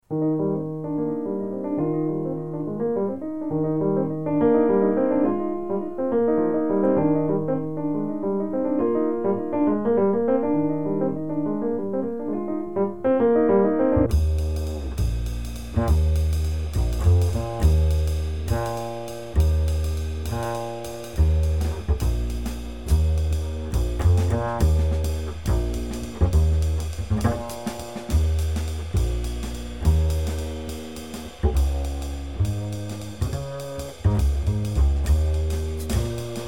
Waltz minus Piano

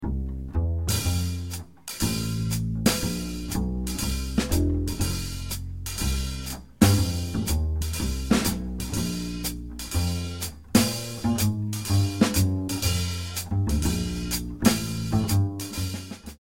bass drums only

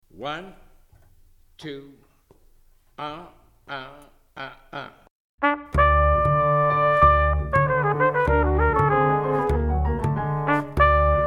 minus drums

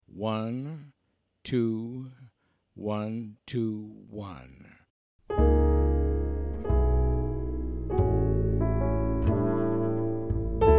minus Drums

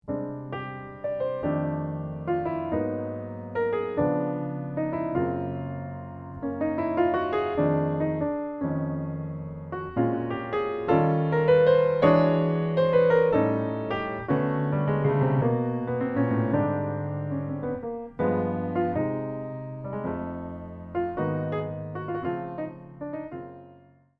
solo piano

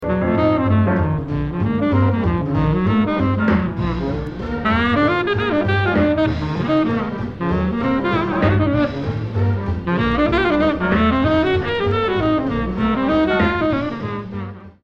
solo

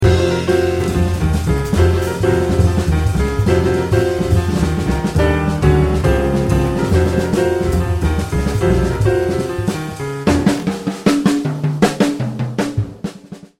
drum shout

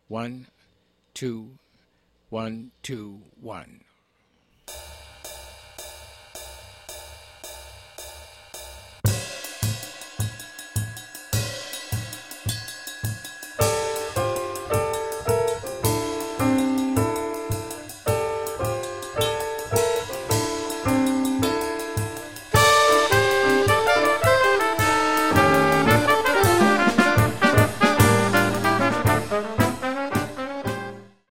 6-8 quintet minus Bass

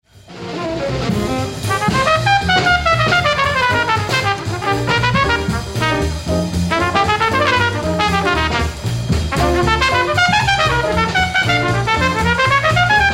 solo alt

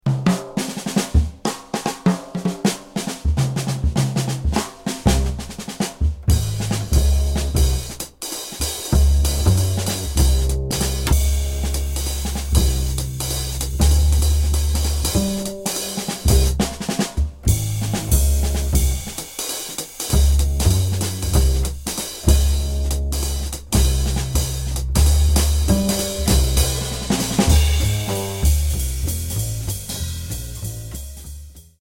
minus Piano

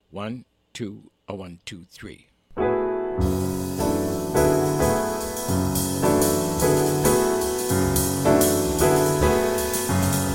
minus bass

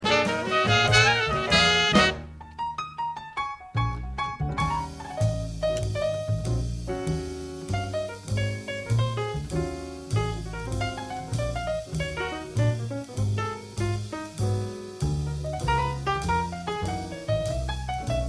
solo, this medium swinger

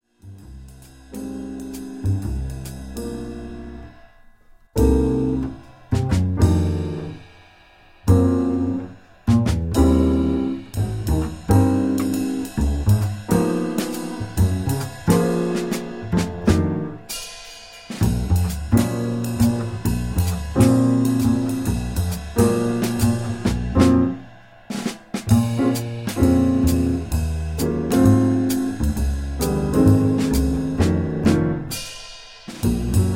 minus melody

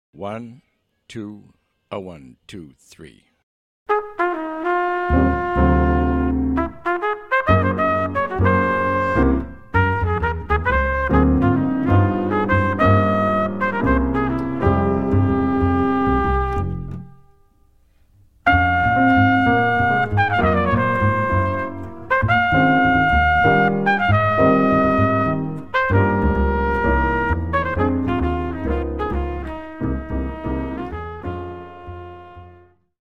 minus drums

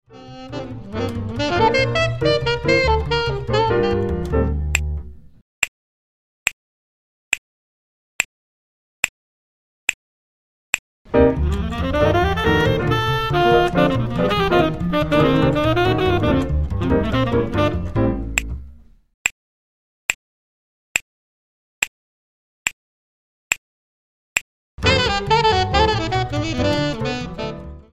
minus Drums snaps

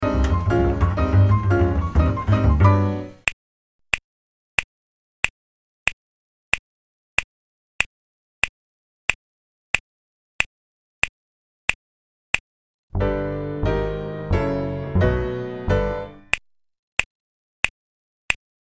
minus Drums snaps

